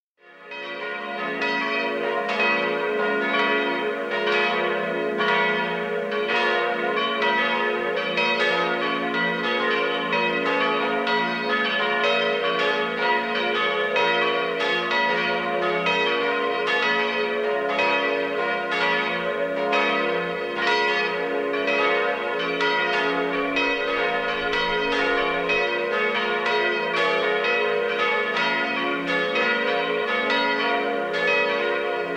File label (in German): Glockengeläut der Pfarrkirche; Wolfakirchen; sternenzeit